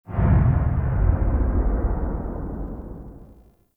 Big Hit; Ambience; Warframe; Nokko Colony

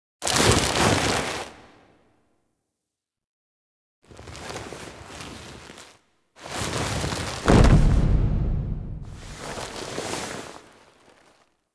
Foley; Cinematics; Lotus Gem Part One

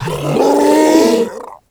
Infested Ancient Strike Scream; Warframe

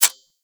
Reload; Warframe; Weapons; Zylok Prime

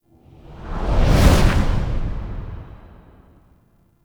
Cinematic Tank Subway Transition SFX; Warframe; Nineteen Ninety Nine; Cinematics

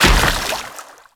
Moa Combined Step Water, Footsteps; Warframe

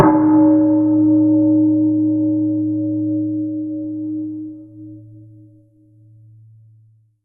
Bell; Warframe; Dojo Props; Bells

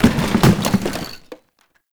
Janitor Cart Impact; Warframe; Ambience; NineteenNinetyNine

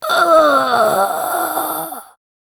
Death; Warframe; Corpus Lich; Persona D

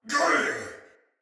Death, Soldier Male; Battle; Sinecure; en

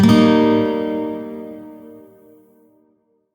Shawzin Blues Chord; Warframe; Lotus Shawzin

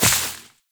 Step Veg, Footsteps, Walk; Warframe; Tenno

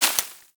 Bullet Impact Debris Grass

